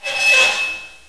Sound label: creak; hl1mp; XDM; ambience